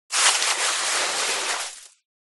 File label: Hand digging in sand with pebbles